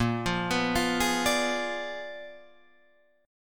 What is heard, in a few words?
A sharp 7th Flat 9th A sharp down Guitar Drop D